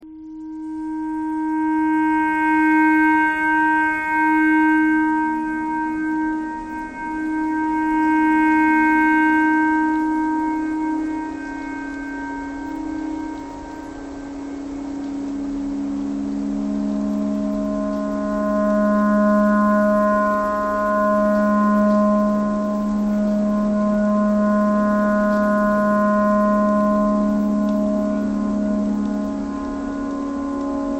tornado siren clarinet thread pentatonic

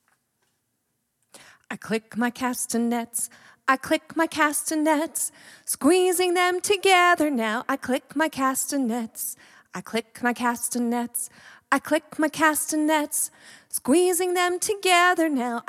i click my castanets

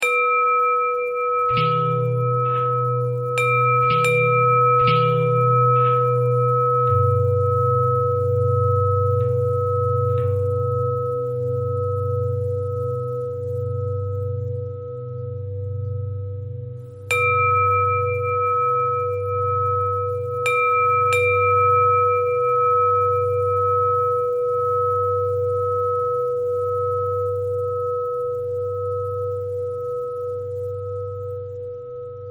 long bell bell thread pentatonic, bells

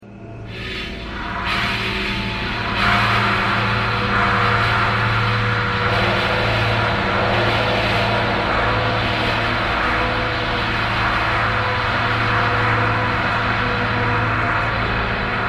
thread noise

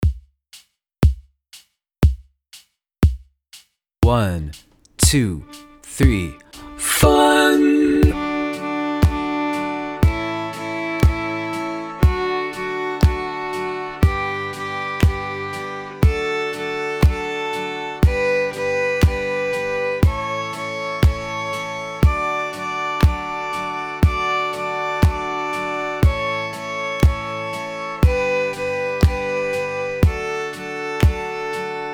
reverse hoedown, fiddle

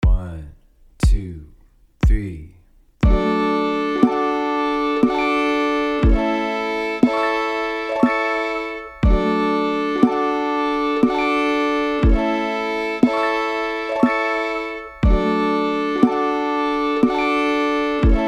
progression triplets

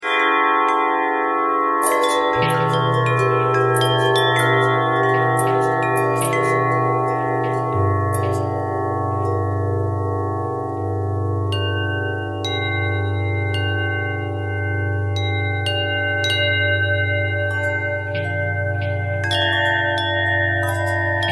long bell bell twist

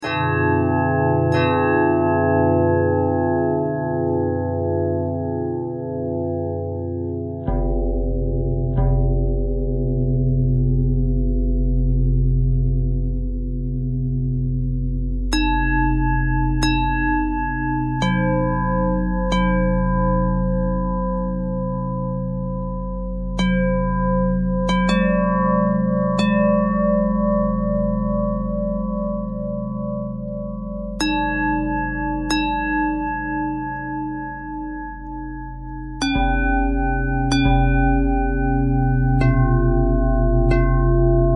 bell bell thread pentatonic, bells

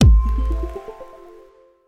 bubbles; patatap

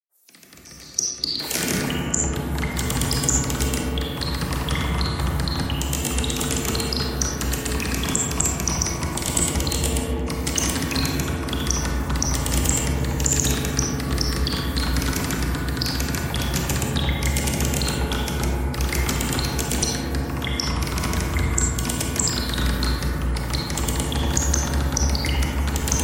bagpipe thread noise; reverb

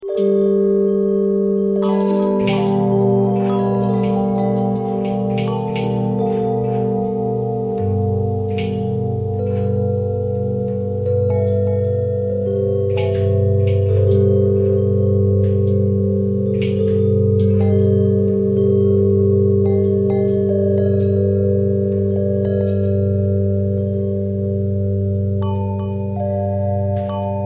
long bell bell twist; bells

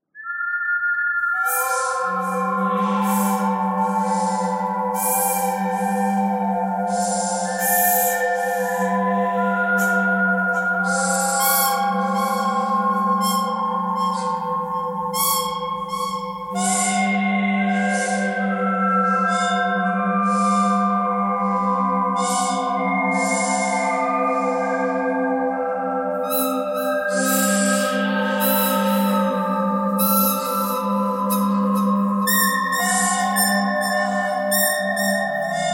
birdcanyon birdtheme thread pentatonic all; birds

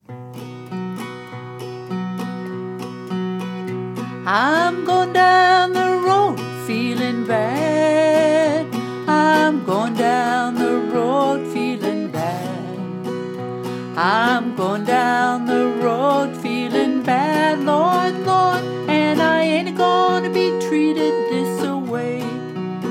lonesome road blues, female

